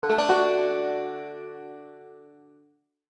banjo chord; Summer Catchers

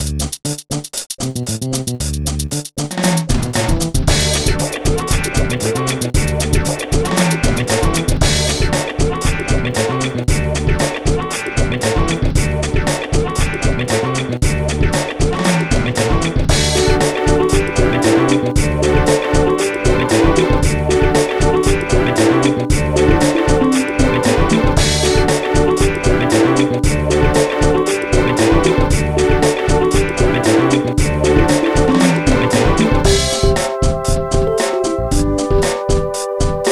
Music Stress; Emma at the Farm; NDS

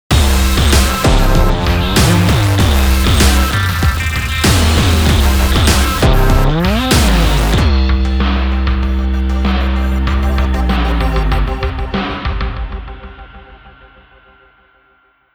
Battle Intro; the king of fighters xv

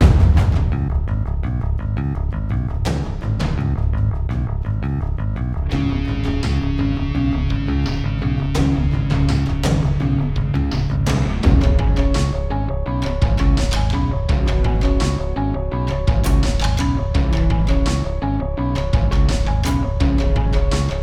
Caves Loop

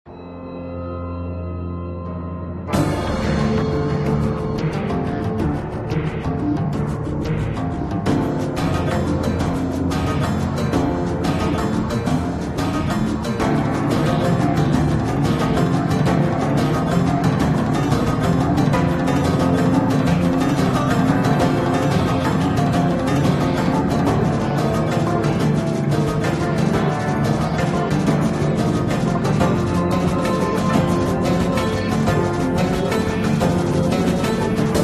Loop Tense; Tales from the Borderlands

